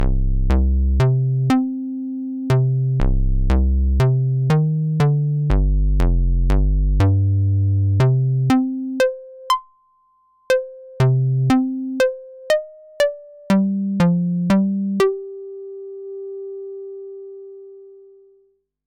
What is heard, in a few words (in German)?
Model 15 Rechteck mit Filter Env